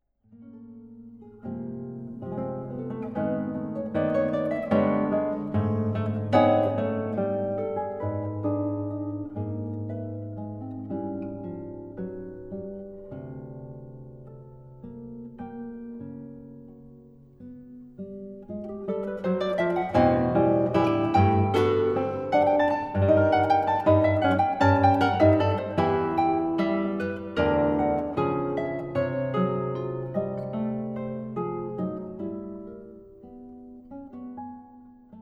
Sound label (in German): Gitarren Duo